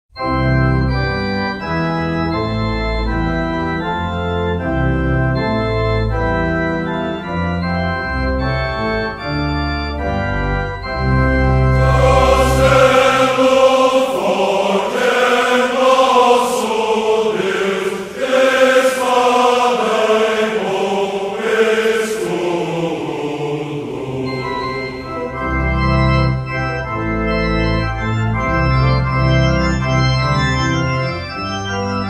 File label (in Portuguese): Castelo Forte Coro Masculino; Martinho Lutero; Hinologia Crista; Cantos da Fe Crista